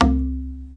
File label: dha no slide, Tabla